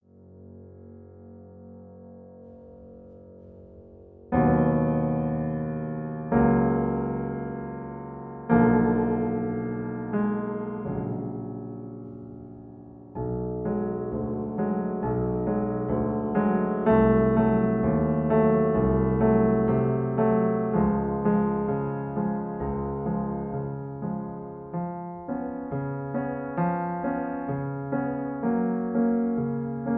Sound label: Accompaniment Gflat